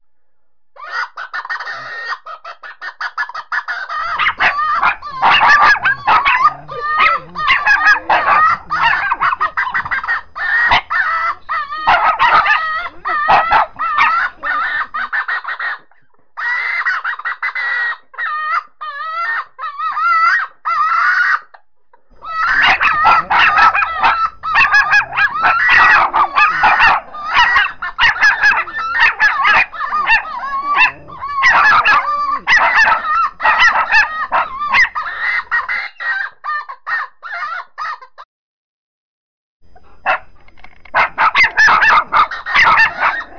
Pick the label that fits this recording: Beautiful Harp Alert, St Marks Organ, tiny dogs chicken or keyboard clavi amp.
tiny dogs chicken